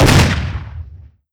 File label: fire, weapons; gesource; cmag